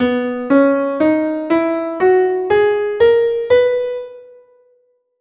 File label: Johannine scale Cb major